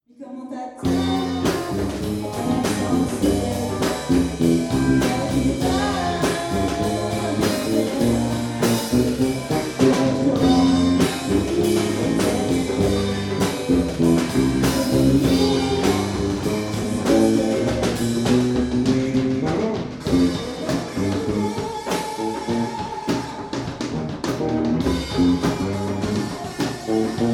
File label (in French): refrain et solo